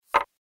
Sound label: chip bet; poker game